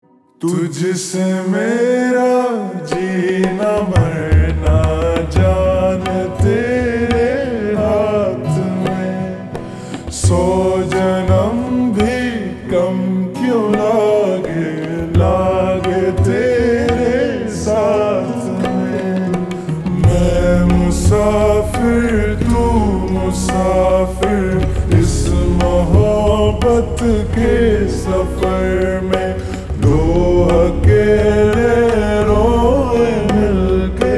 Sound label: Slow Reverb Version Ringtone; Mr and Mrs Mahi